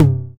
909 TM

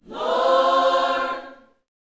YOUTH CH PHRASE, Vocal Planet Gospel; Spectrasonics; Vocal Planet Vol.1 Gospel

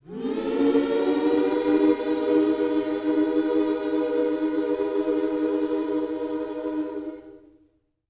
MM MINOR, Vocal Gospel; Spectrasonics; Vocal Planet Vol.1 Gospel

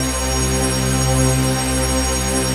RAVE PAD; Best Service; XX Large; Pads

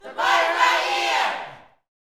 "party", gospel shouts; Spectrasonics; Vocal Planet Vol.1 Gospel; akai; UYC SHOUTS

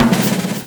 DRMROLL; E-Lab; X Static Goldmine 2; akai

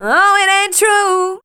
voice spectral, ly short

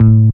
BAS TON; E-Lab; X Static Goldmine 2; akai

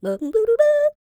SCAT, Jazz

